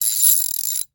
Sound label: Rachet; Tools; 1001 Sound Effects